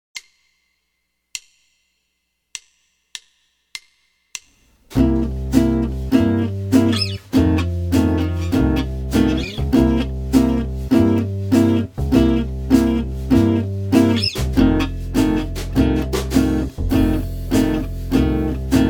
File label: 12 Bar Blues String 6 Chords